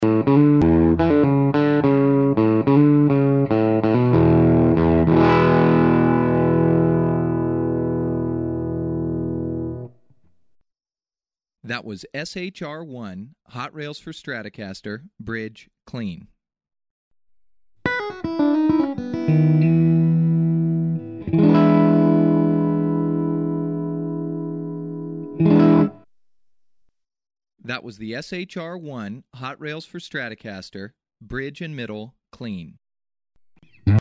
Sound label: SHR-1 Bridge Mid Clean; Position chevalet clean; micros pour guitare